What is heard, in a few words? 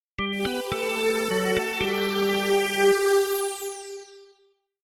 Theme GarageBand